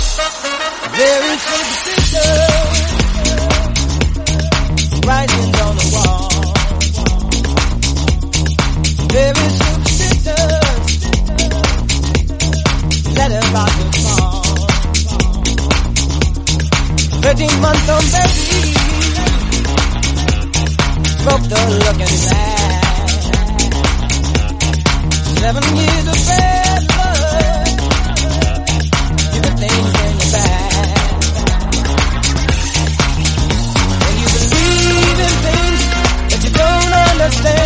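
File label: Disco Mix 118 bpm E flat minor; 1972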